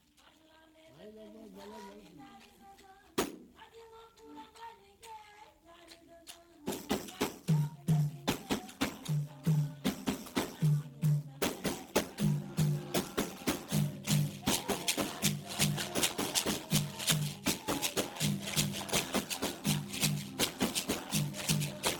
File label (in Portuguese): fanti baiao de princesas juramento; Baião de Princesas é um ritual especialíssimo e único que acontece apenas uma vez ao ano